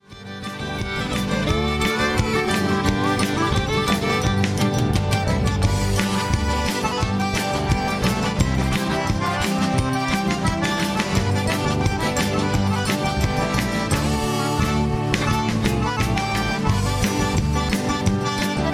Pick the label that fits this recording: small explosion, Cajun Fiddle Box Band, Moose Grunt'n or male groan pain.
Cajun Fiddle Box Band